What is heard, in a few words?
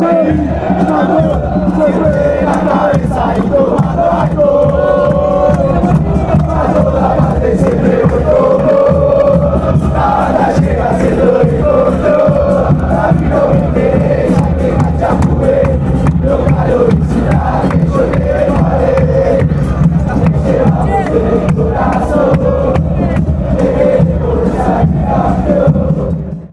chant, river plate